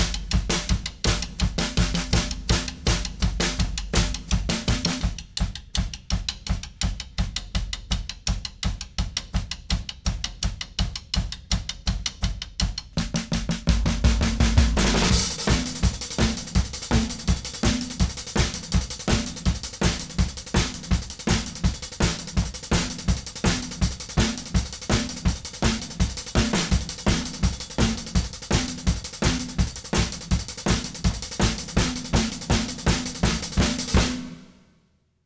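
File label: drum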